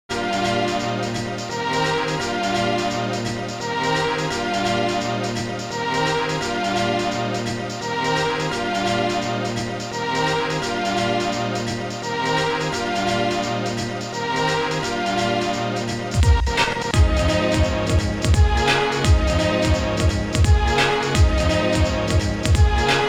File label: Battle & Maniac Mode Menu; bomberman world